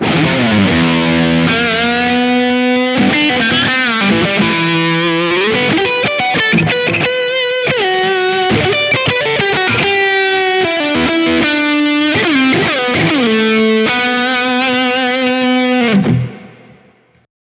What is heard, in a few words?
Reverb Lead Medium Decay; Prussian Blue